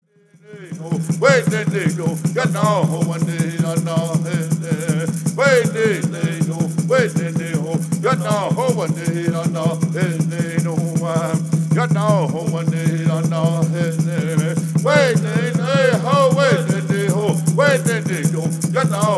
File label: Peyote Song